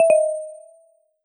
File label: error